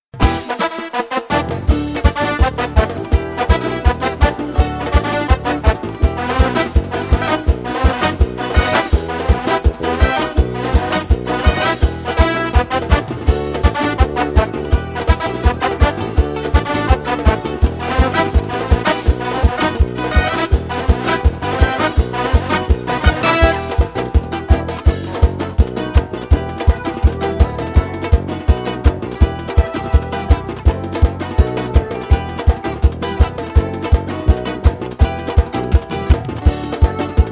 fanfarra

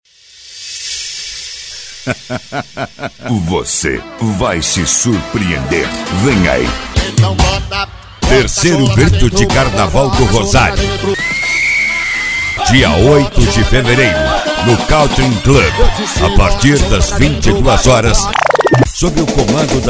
grito de carnaval; Rosário

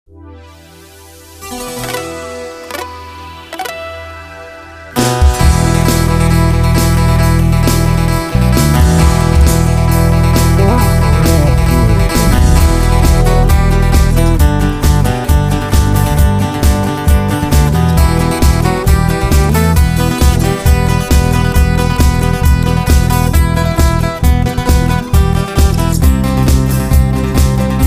instrumental de viola

